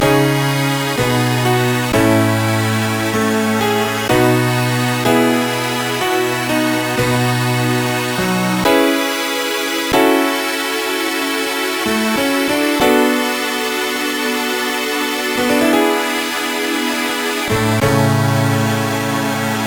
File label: minuet, Trackers; Rogue Male; Trackers Modland